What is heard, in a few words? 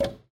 Window knock; Pokémon Friends; Android, iOS, Mobile, Switch, Switch 2; Mamepato